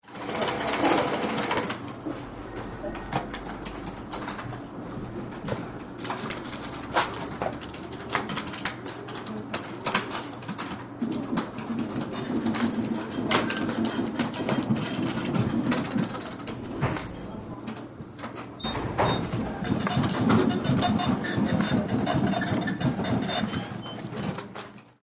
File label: office noise